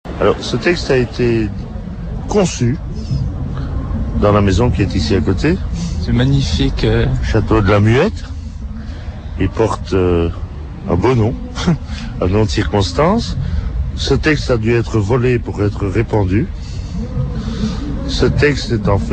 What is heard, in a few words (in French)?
Manif anti AMI ambiance